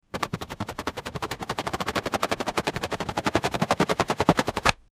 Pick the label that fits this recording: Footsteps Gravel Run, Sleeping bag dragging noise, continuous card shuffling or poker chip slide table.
continuous card shuffling